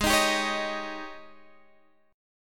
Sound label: Ab Major 7th Suspended 4th Ab, Guitar